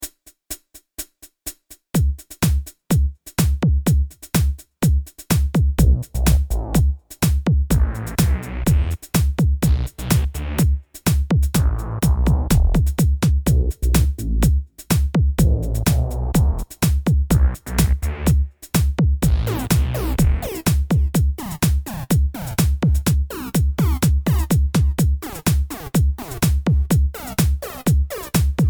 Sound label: With a whistle; House